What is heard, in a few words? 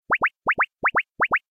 bubble pop